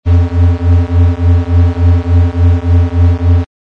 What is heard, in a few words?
signal tones fast